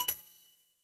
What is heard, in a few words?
ui chime